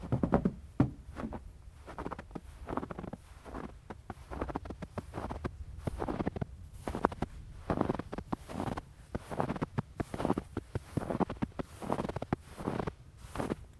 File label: Stepping In Snow